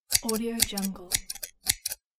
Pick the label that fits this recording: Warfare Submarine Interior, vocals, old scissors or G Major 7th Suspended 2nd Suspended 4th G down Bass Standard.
old scissors